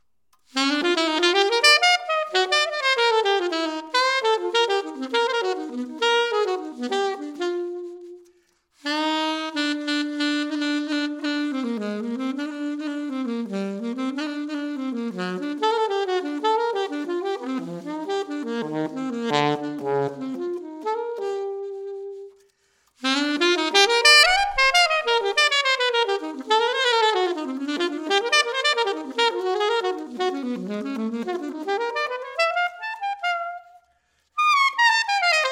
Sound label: Alto Sax Improv